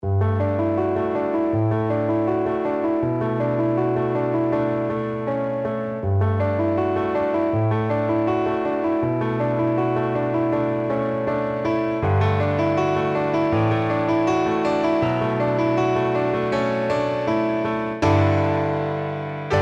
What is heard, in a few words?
mini grand bright